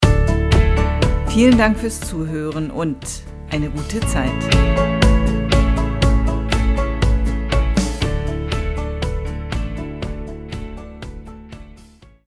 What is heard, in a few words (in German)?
ausstieg musik ausgefadet